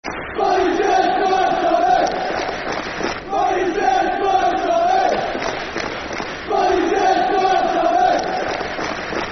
Football Chants; Mark Hughes' Blue & White Army; Blackburn Rovers Brfc

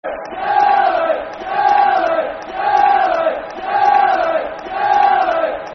Killie [Football Chant (Soccer Song)], Kilmarnock; fanchants

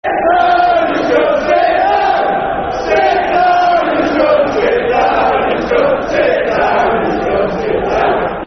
Sit Down Scum, Football Chant; Soccer Songs; Cheltenham Town Ctfc

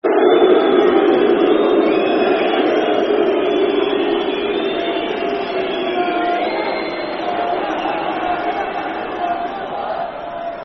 Boo, Football Chant (Soccer Song); Bradford City Bcfc

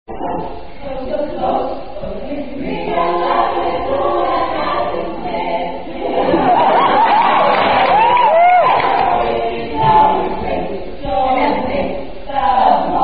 Drinking Song

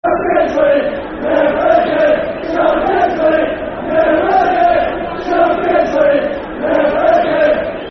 Champions League, Never Again Football Chant; Crewe Alexandra Cafc

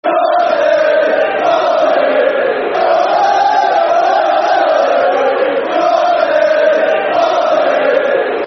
Ole, Ole, Alex, Football Chant (Soccer Songs); Crewe Alexandra Cafc

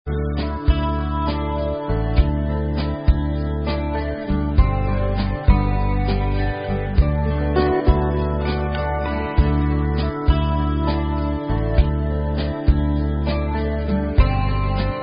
Karaoke Songs; Girl Scouts

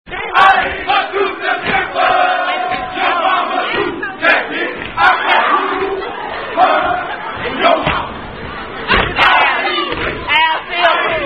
Fraternity Chant Song; The Zoo At Gsu Setting It Owt; Unknown Omega Psi Phi (Que) Fraternity Chant Song

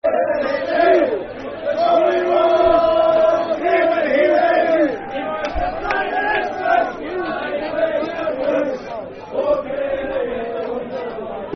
Football Chant (Soccer Song), N. Ireland; One David Healey; N. Ireland N.i.f.a

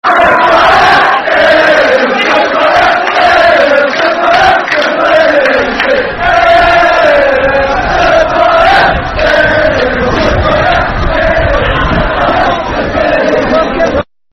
Ayr, Ayr, Super Ayr. Football Chant (Soccer Song); Ayr United Aufc

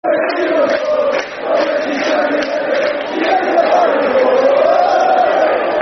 Football Chant (Soccer Songs); Posh Spice; Crewe Alexandra Cafc

